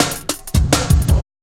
Bouncy Cut, Jungle, Drum Loops 166.5; Zero-G Jungle Warfare 1, 2 & 3

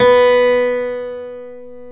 KEY HRPCR, Roland; Roland - LA Composer vol. 1

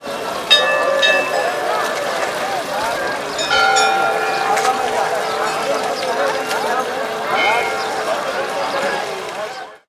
BENARES, AMBIENCE; USB Soundscan vol.45; AKAI

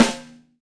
R&B Snare; Snares

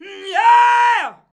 "mnyeah", shouts; Best Service; Hyper Dance

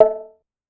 Cowbell CR, Drum Machines DDrum-AT Pitched Down; 300 Drum Machines; Clavia

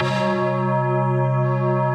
DARK BELL; Pro-Rec - Synthsonic; JV FILM

